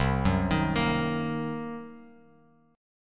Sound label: C Minor 7th Flat 5th, Bass Standard; Cm7b5 arpeggiated; JGuitar